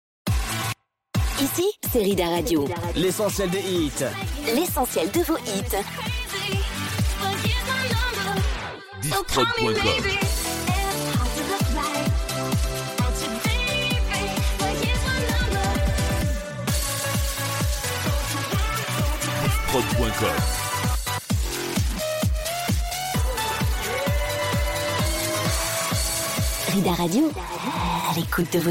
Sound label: Medley Hits 2000s